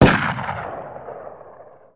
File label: exterior shotgun shot